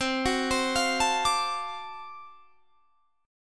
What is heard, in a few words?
D 7th Sharp 9th C, chord